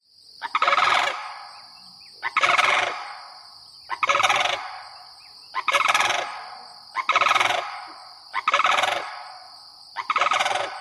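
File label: SANCRA rattle calls, sandhill crane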